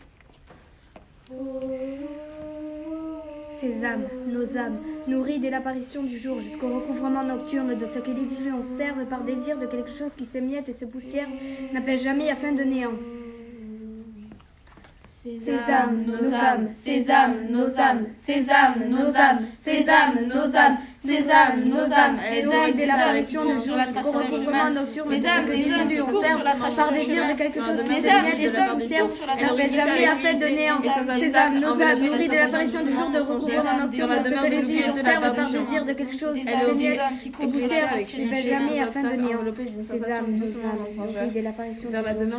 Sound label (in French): Des âmes des hommes